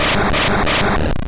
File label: Wrap; Pokemon; Gen 1; attack moves; RBY